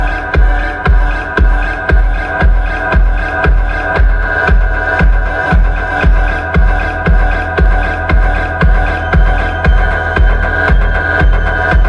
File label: mexican techno